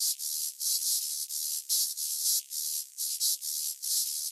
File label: sparks, ambient